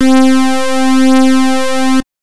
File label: Synths Note C; Best Service; House Definition VOL-1